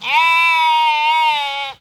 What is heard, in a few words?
sheep drag; hella farm